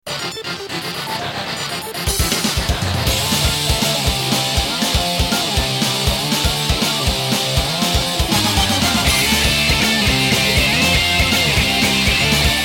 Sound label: Instrumental; nintendo wii; dragon ball z budokai tenkaichi 3